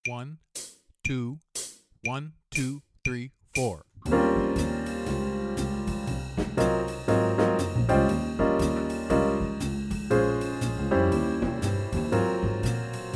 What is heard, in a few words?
Guitar Play a Long BLUES, Jazz; Jazz-Band; All-State